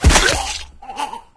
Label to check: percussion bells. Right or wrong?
Wrong